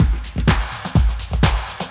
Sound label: Loop, Roland; Sound & Vision - Gigapack I